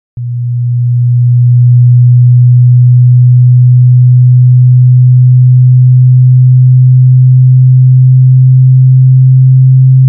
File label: sweeps from 120hz to 180hz